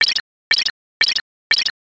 fs bush

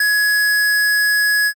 ecg flat

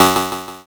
zap

